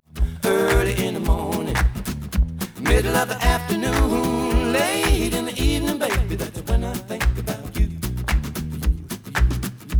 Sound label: Stereo output noised; 3bit